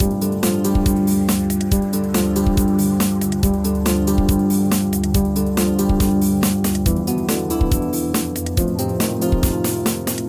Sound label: Game Victory; Sprill Aqua Adventures